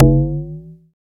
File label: long tom sample f sharp key, tom sample tuned to the F# note. Loudest frequency: 642Hz; tom drum samples; one shots